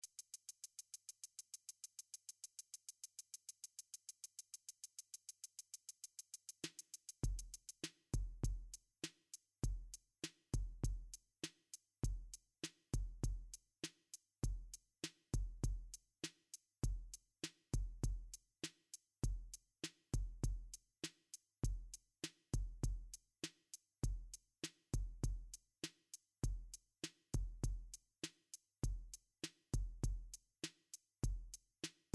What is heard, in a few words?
mpx8 MIDI In Drums